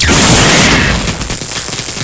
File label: smartgun fire